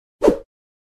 Fast whoosh metal crowbar

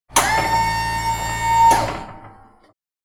Forklift lifting positioning forks servo whine

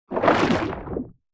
Aquatic water game menu ui clear

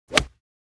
Golf swing hitting a golf ball

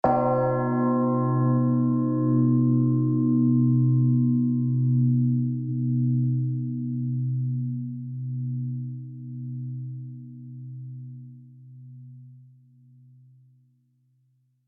Cathedral bell ring single hit; church bell

